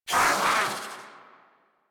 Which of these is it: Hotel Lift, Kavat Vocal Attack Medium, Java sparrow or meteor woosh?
Kavat Vocal Attack Medium